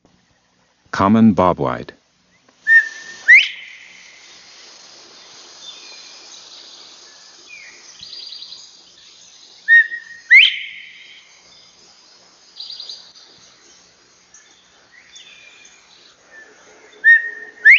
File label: bobwhite